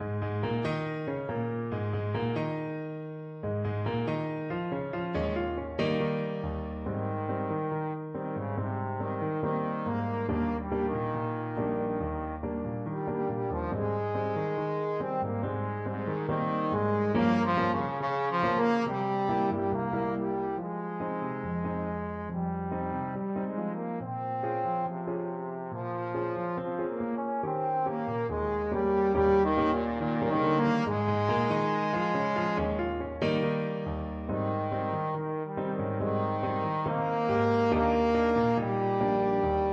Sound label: bossa, trombone